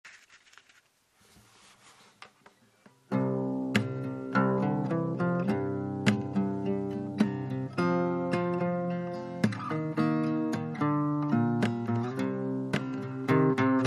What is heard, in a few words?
Urban Groove